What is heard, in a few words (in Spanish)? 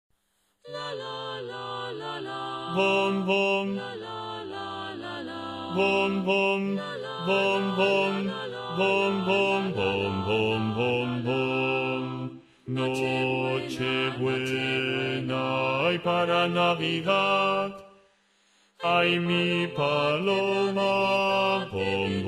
bajos